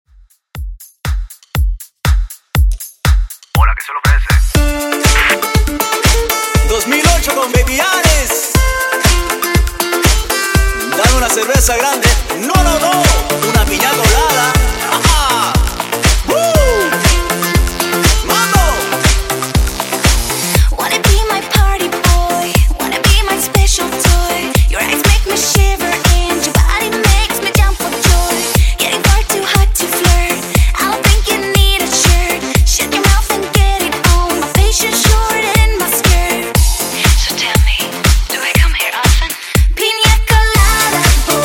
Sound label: Hard Trance Redrum 120Bpm